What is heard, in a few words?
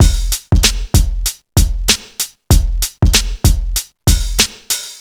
96 bpm breakbeat f key